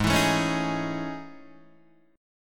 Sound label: A Major Sharp 11th, G sharp